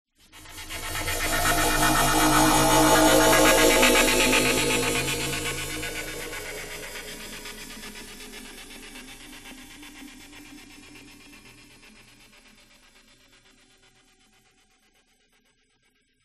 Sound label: sound design swell fm metallic stutter; zapsplat